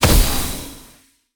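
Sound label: Pillar red spawn, monster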